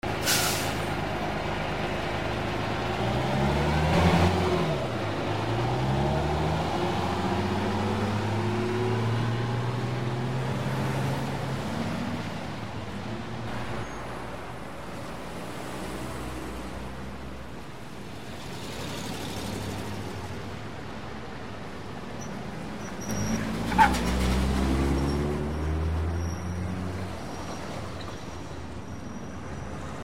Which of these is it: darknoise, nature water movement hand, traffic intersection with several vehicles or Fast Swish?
traffic intersection with several vehicles